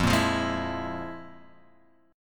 F Minor Major 7th Double Flat 5th; FmM7bb5 Chord {1 1 3 1 X 0} strummed

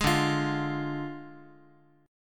D Diminished D, Guitar; strummed

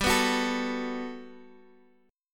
G Major 9th, Guitar, Open G; 17,16,16,14,x,x; down

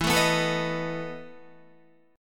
E Major 7th Suspended 4th, Guitar; strummed; JGuitar

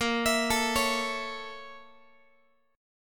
A sharp Minor Major 7th Flat 5th A sharp Guitar; A#mM7b5 arpeggiated; JGuitar

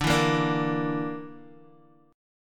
D Suspended 2nd Sharp 5th D down Guitar; strummed

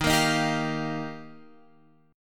Eb Major Add 9th, Guitar Open F; x,10,10,10,10,12; strummed

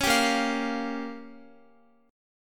Bb Minor 6th, Guitar; strummed; x,x,11,12,10,12; 4E,4A,5D,5G,5C,6F

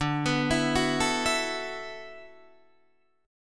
D 6th Add 9th D, Guitar, Open D

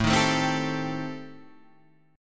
D sharp 6th Flat 5th, A

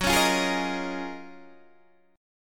F sharp Major 13th Guitar DADGAD

